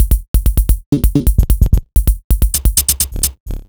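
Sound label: Odd Glitch, 130 BPM, Percussion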